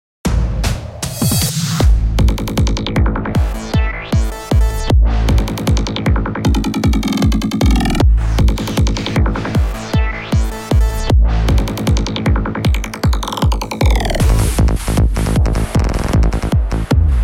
techno; סט קורג

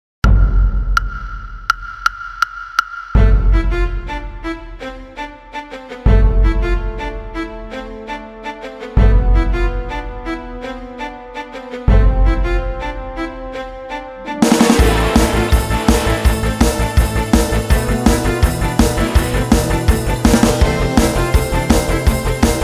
intro rock

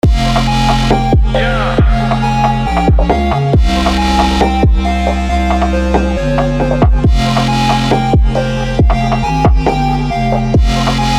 drill

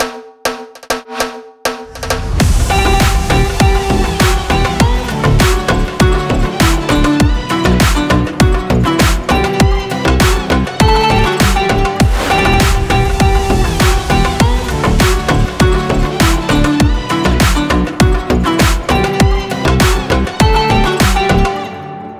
ethnic track

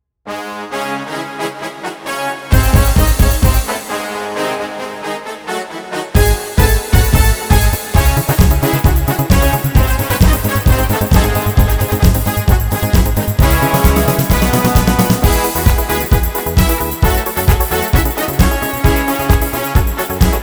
ketron amp brass

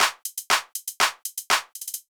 clap

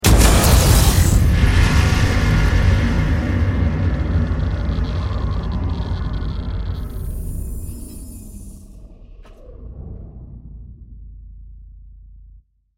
cinematic sound effect

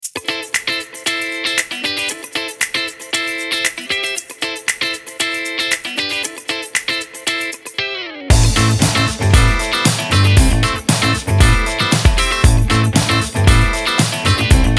retro funk

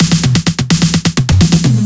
short fill 128bpm

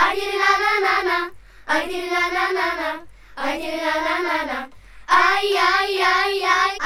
crowd vocal children 140

